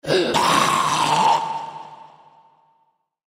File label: feral ghoul aware; npc